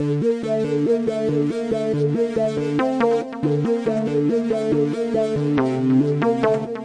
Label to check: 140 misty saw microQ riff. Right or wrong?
Right